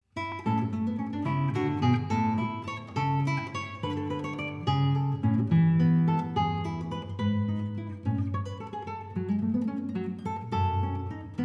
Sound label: gigue; weddings